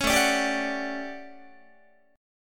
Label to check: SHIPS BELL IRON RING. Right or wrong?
Wrong